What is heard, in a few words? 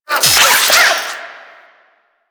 Necramech Repair Drone Claw Spin Alt; Enemies; Warframe